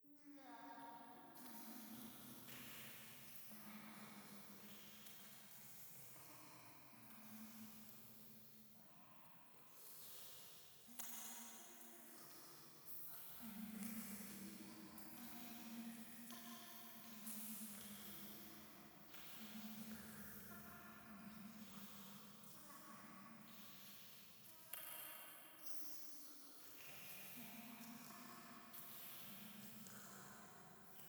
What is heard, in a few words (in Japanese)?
ゴースト フェラチオ